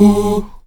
AHOO G#3B, Heart of Africa, STAC.OO-V; Spectrasonics

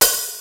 XD-5, DRUMS PERC, BOSS

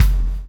CHAIN; Spectrasonics - Backbeat

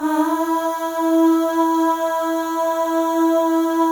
AHS, FEM; Spectrasonics; FEM.1 AHS

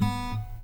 ACSSFTFNGR, Guitar

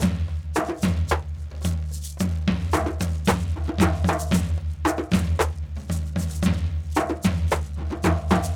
Bag Pipe Groove, Rattle, Brekete, Djembe; Donno

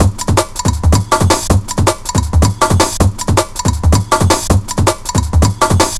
Drumloops, 160bpm; Drum Bass; Zero-G; Total Drum Bass

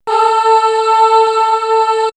Choir; X-Static Goldmine; backtothe70's

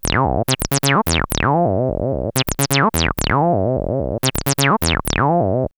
303 Loops; X-Static Goldmine

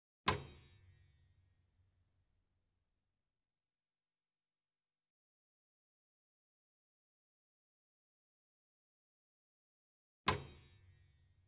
C8 Grand Piano & Sample Piano; KORG; Sound Sampling Collection VOL-3; Grand Piano 88

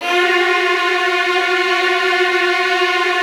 F, Virtuoso Strings, STR HLF TRLL; ILIO; Kirk Hunter Virtuoso Strings VOL-3; 90 s Sample CDs

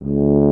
BRS TUBA, Solo Instruments

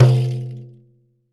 Doira Dun; Roland BK-5; Percussion

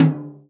tom, Real Drum Kit, jungle kit

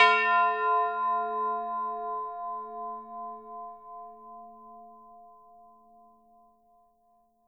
tubular bells hit F4; AO bell

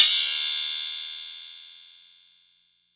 Metal

